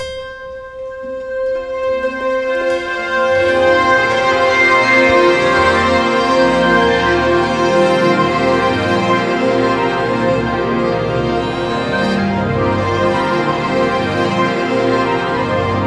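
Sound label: orchestra tune up; OTHER SYNTHS; Rubber Chicken Software Sample Bank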